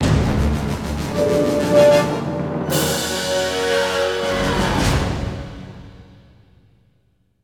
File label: Combat Win; Combat Reg6 Win 02